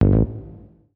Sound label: BASS SHOT HOUSE 125 A; eJay; Singleshots